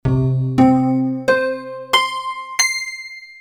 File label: d50 metal harp; commodore amiga